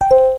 message sound; Kik

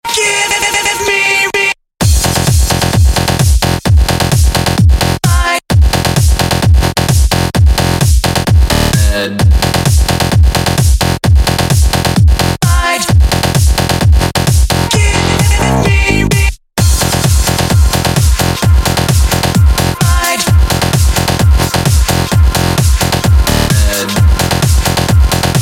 Elektro Rock

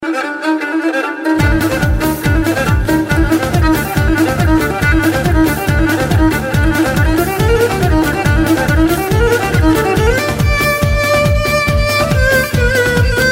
Cretan lyra Solo

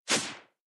Elevator Block

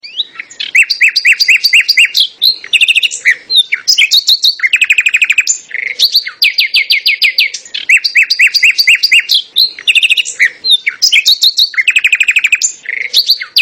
Birds Beautifull

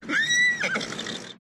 Horse whine

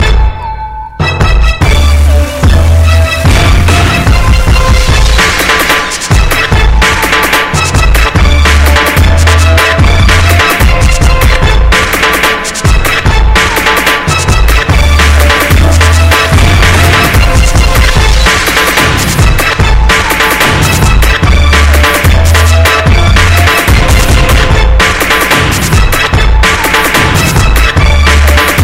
Riddim

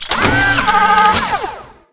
Sentry Gun

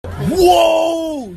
Woaahhh

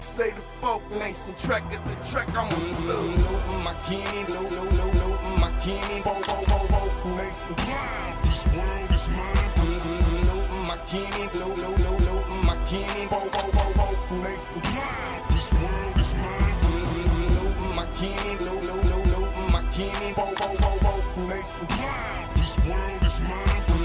Folk

